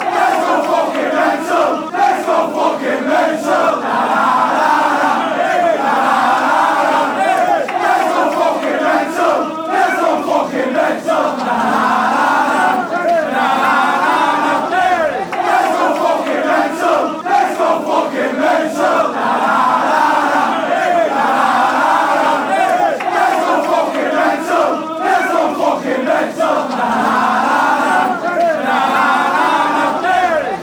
lets go mental everton, fan chants

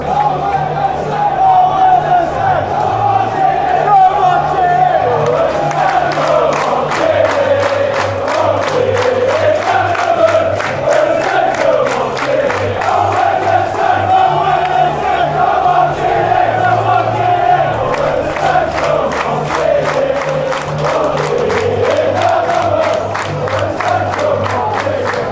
oh when stags, fan chants

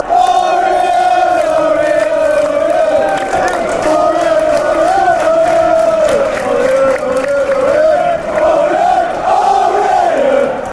orient orient orient, fan chants

